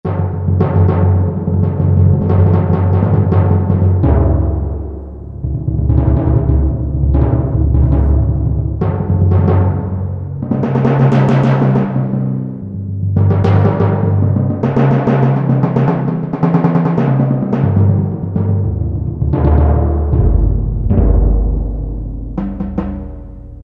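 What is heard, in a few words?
Timpani Ambience; Roland L-CDX-04 Strings And Orchestral Percussion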